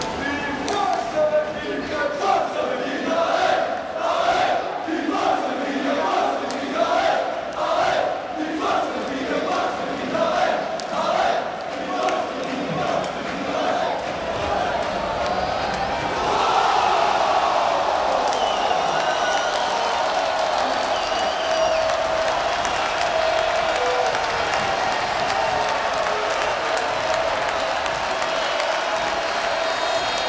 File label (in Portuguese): Forca Benfica, Chants